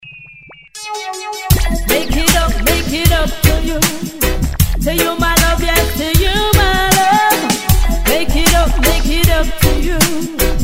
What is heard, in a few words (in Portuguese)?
toque de reggae